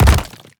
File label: enemy ash spirit melee